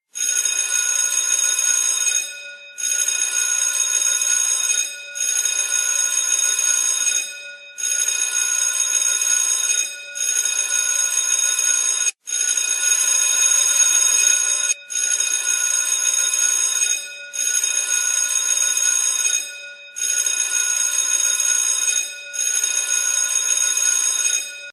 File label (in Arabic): جرس مدرسه جرس طلاب مدرسه جرس فسحه مدرسه; صوت جرس المدرسة